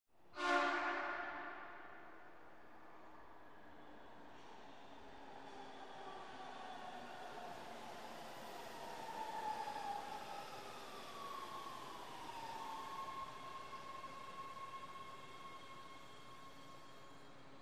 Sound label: Subway Train Horn Blo, subway